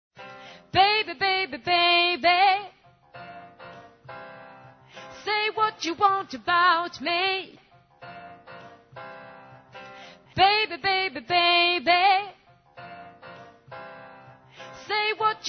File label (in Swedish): alt, stammor